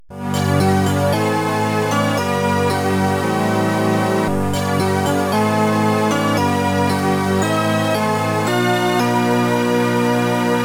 Unknown Jingle; jurassic park data east pinball soundtrack

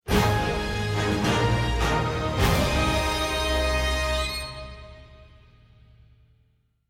Item Get

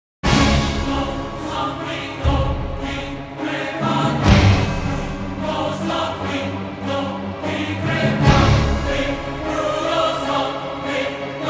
dragon attack; heroes of the storm full gamerip 2015; dragonshire

